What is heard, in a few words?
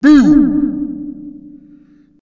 ghost attack; npc